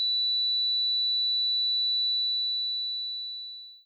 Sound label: ear ringing left